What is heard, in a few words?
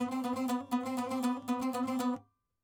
saz